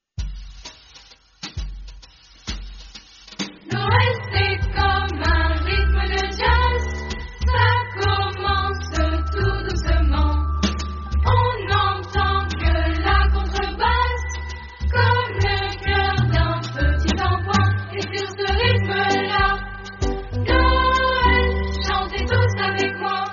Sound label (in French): noel jazz